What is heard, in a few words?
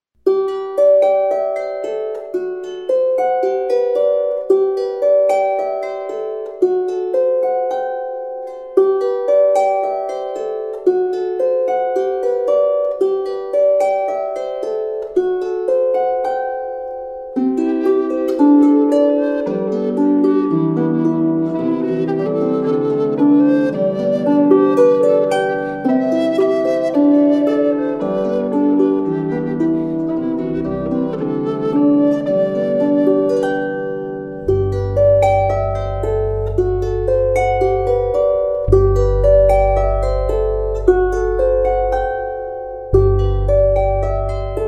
Instrumental; Besuche das mystische Land Anemoia. Elfe Evi führt dich an magische Orte, heilsame Plätze